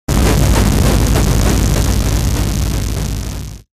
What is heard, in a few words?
explosion